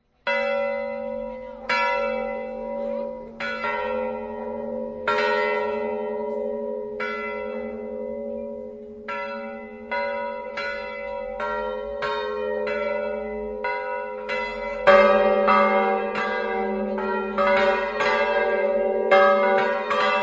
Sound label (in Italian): campane, fratta